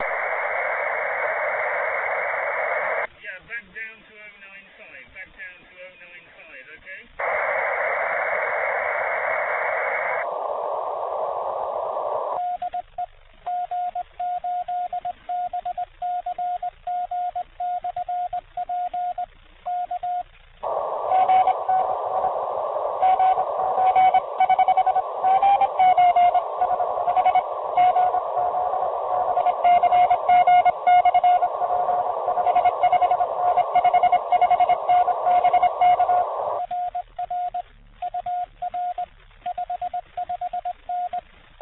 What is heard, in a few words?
cw qso